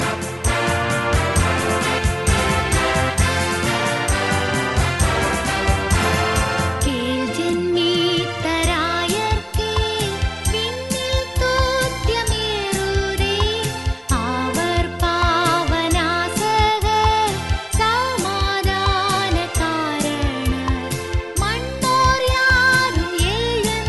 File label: tamil carols